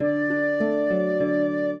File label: minuet, flute harp; Mozart